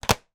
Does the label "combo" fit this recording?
No